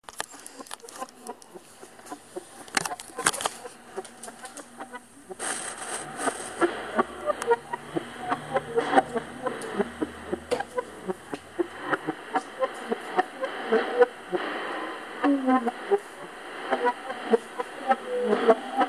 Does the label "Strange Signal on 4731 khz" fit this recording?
Yes